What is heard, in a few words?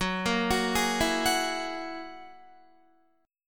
Gb 9th Gb down Guitar 4E,4A,5D,5G,5C,6F; Gb9 arpeggiated